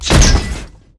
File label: Grenade Launcher Shot; SFX Kit; Weapons